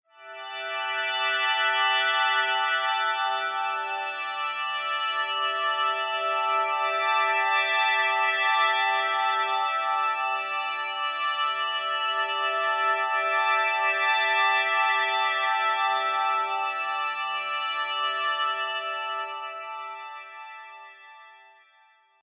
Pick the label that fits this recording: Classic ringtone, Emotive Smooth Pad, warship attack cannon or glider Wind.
Emotive Smooth Pad